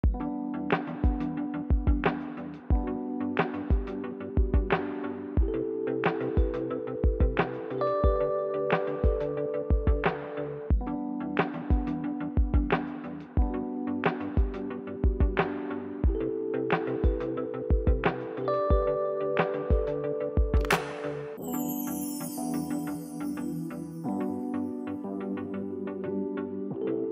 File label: Podcast Background Music While Talking